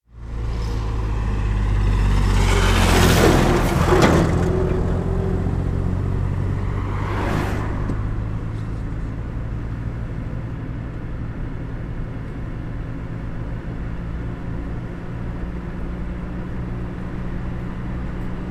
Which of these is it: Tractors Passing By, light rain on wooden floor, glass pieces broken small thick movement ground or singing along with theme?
Tractors Passing By